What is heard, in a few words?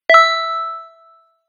text receive